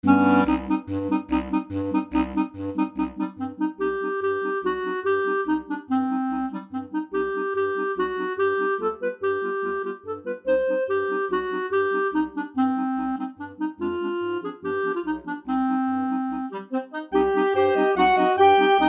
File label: clarinet